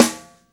PICOLO SN, Drum Machines, Akai MPC-3000

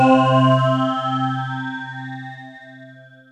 GLASS EQ, OLD SYNTHS; Rubber Chicken Software Sample Bank

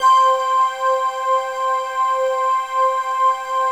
digi pad, Pads

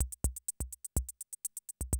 CR78 125, drum loops

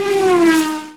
Formula Car Pass; mt-32

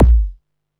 BD SOFT, Techno 138 BPM

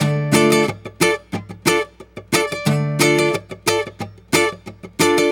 guitar, stringed instruments

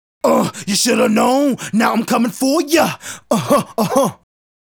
Male Solo, Hip-Hop and RnB Vocals

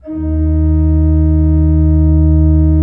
D1, Cathedral Organ; Propeller Island; ITAL.PRINZIP

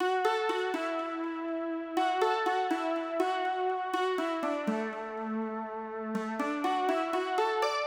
Dmaj 122BPM Pluck, Music Loops; 99Sounds; Monosounds; PANDORA BOX!; Coconut Coctail

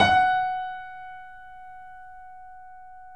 F#, Boesendorfer, 3D Pianos; E-MU Producer Series Vol. 5; 3-D Audio Collection

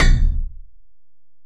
Ac Perc, Drum Machines Alesis DM-PRO

